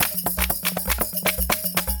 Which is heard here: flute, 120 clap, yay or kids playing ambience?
120 clap